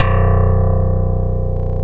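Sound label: Electric Bass Slap, Roland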